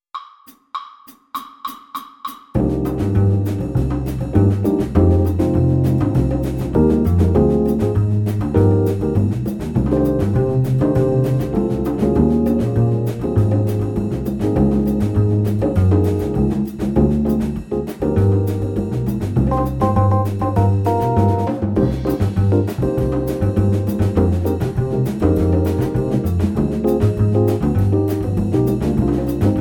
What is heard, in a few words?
Vibes Samba Play a Long; All-State